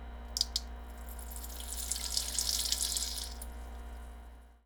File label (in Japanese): 勢いのあるおしっこ音（声なし）, トイレ